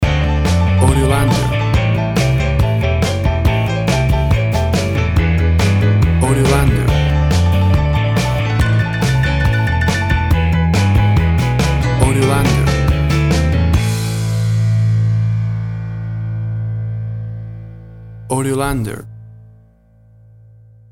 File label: Working Mans Country Rock and Roll Boogie